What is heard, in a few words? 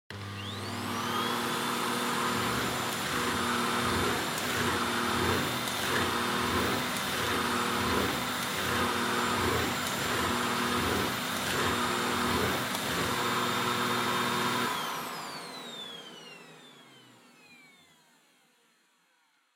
Vacuum Cleaner Vacuum Floor Wood Mid